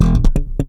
FUNK BIT, Bass; Masterbits CLIMAX 7 - Bass; KEN SMITH BS

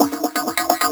Processed Perc; Sonic Foundry (Sony Creative Software); Electrocution; Beat Components

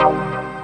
DC Melodia Chord, breakcore; voxandchords

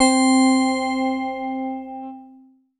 VS BELLI; Synclavier Sampler Library; KEYS AND GUITARS

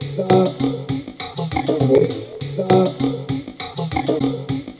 Vocoded Drum Beat, Electronic Beats; Sonic Foundry (Sony Creative Software); Myths of Technology

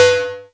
percussion, drum machines, FutureRetro FR777

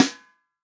REAL DRUMS FUNK KIT

